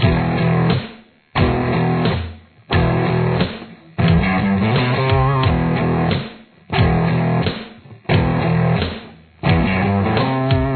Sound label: solo rhythm, guitar